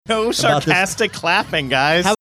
sarcastic clapping

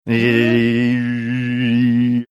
making electric toothbrush sounds

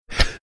making funny sound

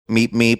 meep meep

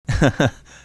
heh heh

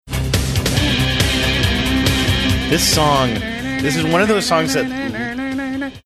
singing along with a song

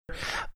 making so called death rattle

